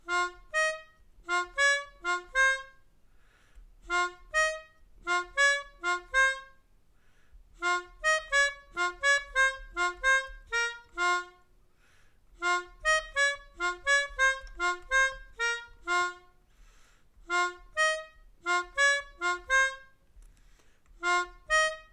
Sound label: Melodica